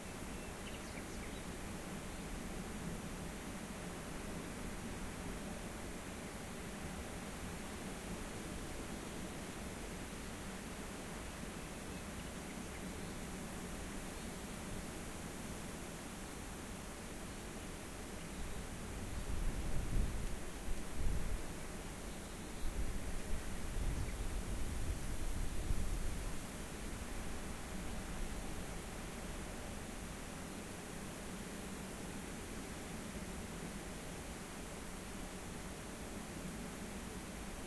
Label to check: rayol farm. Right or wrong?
Right